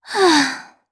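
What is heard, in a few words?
Vox sigh Japanese; DarkFrey; kingsraid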